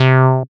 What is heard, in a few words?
SYN TB ORIG, Roland TB-303; Sound & Vision - Gigapack I CD 2